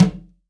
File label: CONGA L; STEREO CONGA; Cuba